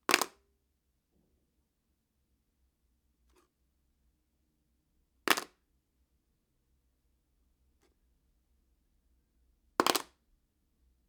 Cellphone Falling To The Floor

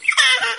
Infant laugh

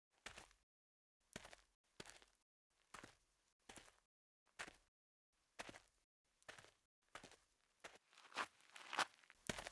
Footstep On Gravel

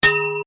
Metal Pole Hit

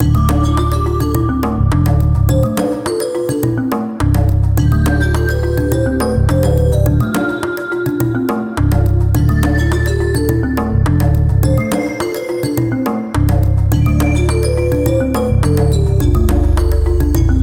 Tribal Game Theme Loop

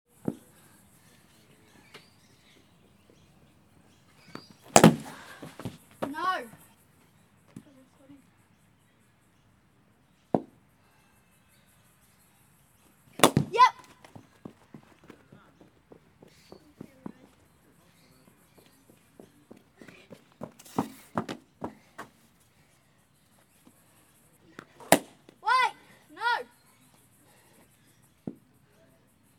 Cricket Sport

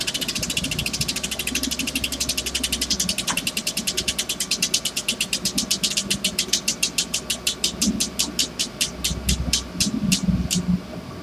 Bicycle Wheel Cut

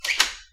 Front Door Close

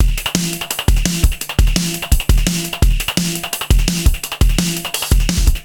Subfilter

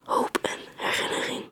Hoop

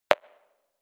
Click

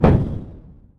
Explosion

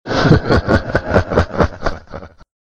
Demonic Laugh

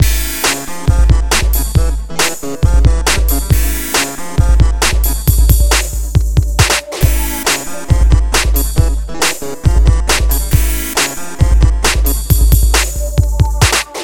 Omen Loop

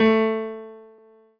Piano Ff

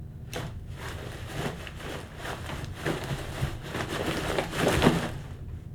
Clothing Rustle

